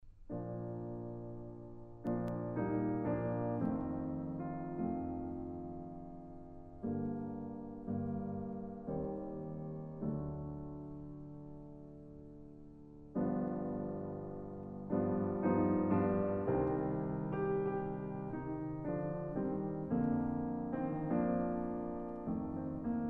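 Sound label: piano trio; bache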